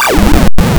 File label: SCREAM; YORP